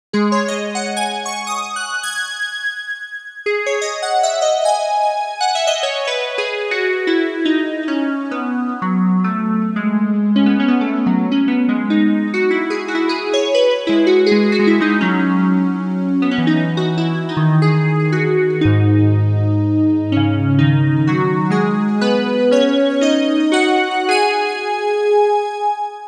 pluck sound harp feel

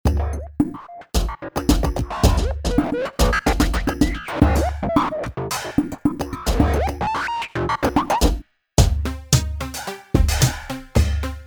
destructive

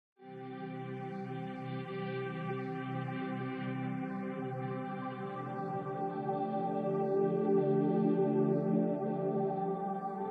multilfo

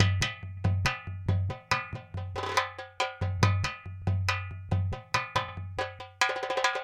distant rhythms

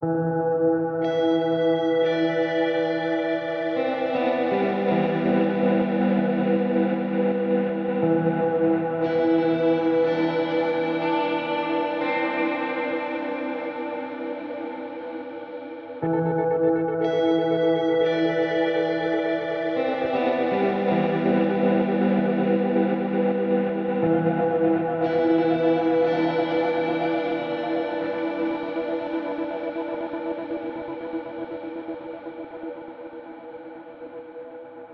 solo

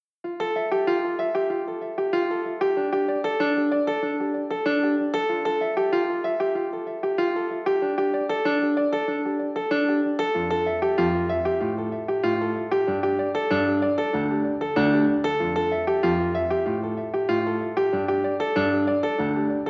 resonance

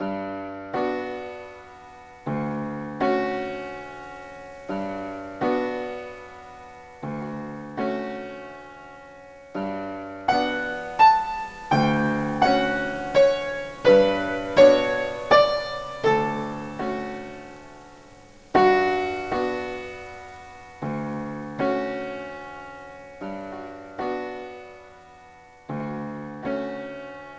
room piano

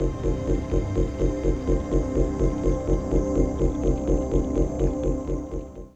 digital hum hoover note loop

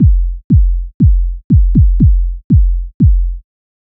basic bassdrum 120 bpm

